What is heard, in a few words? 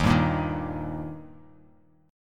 D sharp Major D sharp, chord; strummed; 11,10,8,8; JGuitar